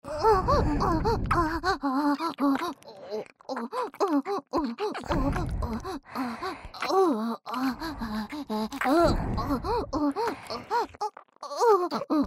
オホ喘ぎ SE無 ＋両耳キス; おまけループ（オナサポ）; 3WAYループ

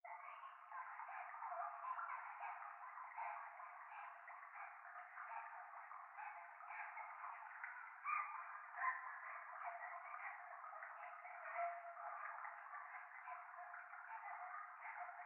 教会 可愛い喘ぎ 川のせせらぎ

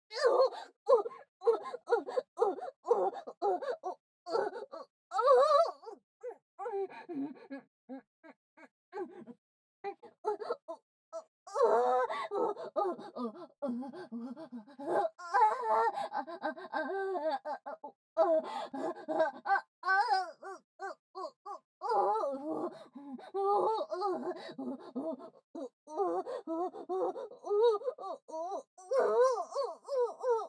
バック オホ声; アドリブ集

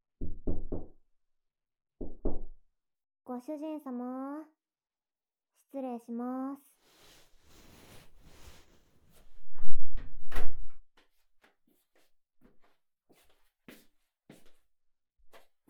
ちょいツンメイドさんの耳奥リアル耳かき

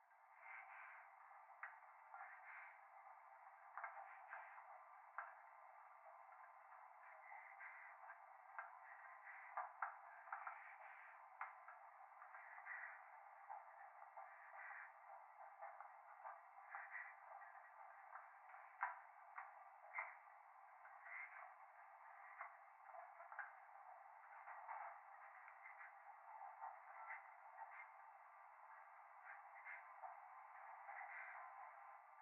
風呂 左耳密着吐息 電車

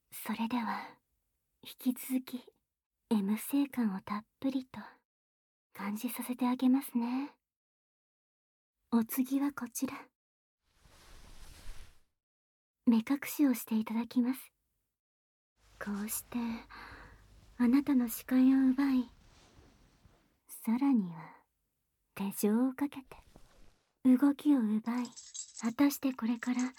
耳舐め, ASMR; レッスン02 目隠し手錠で誘導尋問されて深イき高圧耳舐め

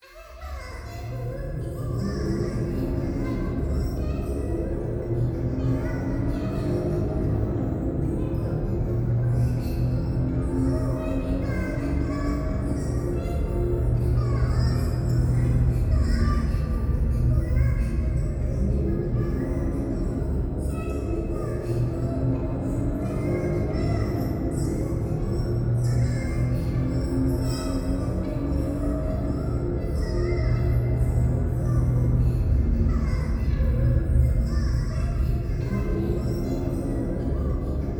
風呂 可愛い喘ぎ 車の中

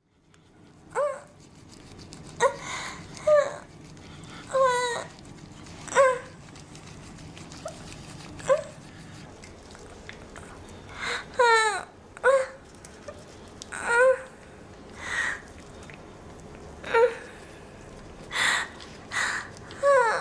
可愛い喘ぎ声を出す処女JKのオナニーボイス; ガチオナ

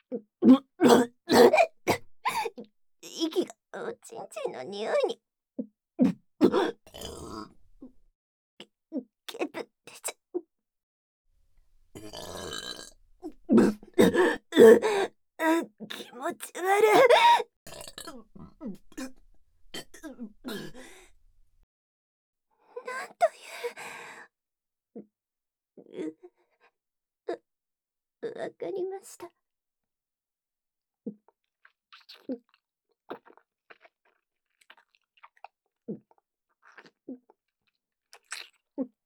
ゲップシーンまとめ

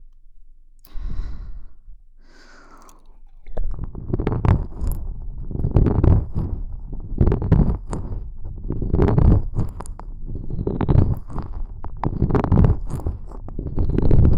ごりごりごりごりコース

